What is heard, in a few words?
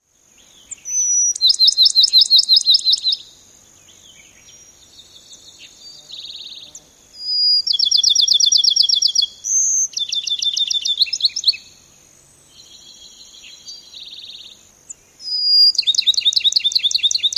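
songs number 2, sparrow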